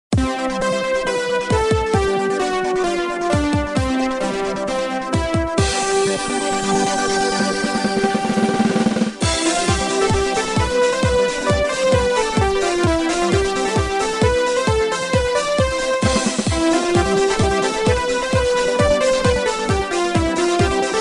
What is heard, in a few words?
trance